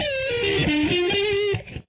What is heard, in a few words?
verse 2 2nd guitar